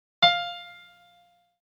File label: piano f